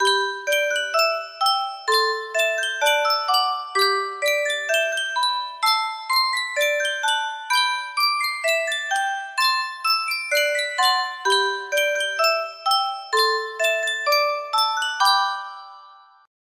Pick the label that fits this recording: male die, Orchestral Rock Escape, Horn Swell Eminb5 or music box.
music box